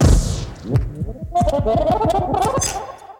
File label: Kaos FX, Ambient Grooves